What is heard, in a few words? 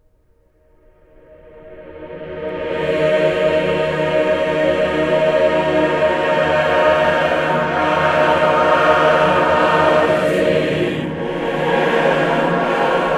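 MISERERE reverse, Voices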